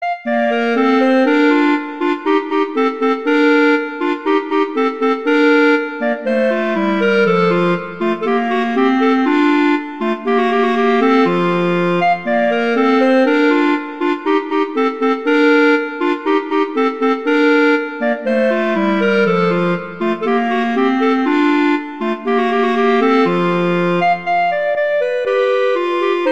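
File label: Duet, horn; Mozart Duets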